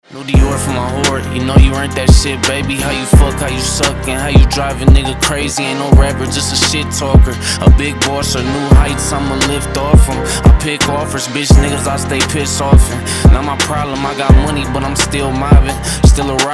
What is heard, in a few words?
HIP HOP